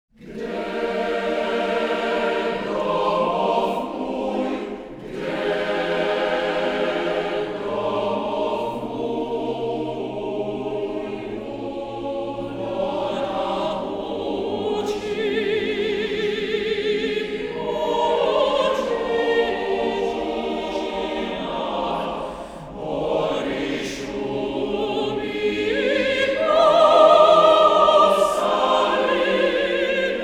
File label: Czech Anthem VOCAL; Hymna Č. r. - první sloka, vokální hlasy (Škroup - Bok)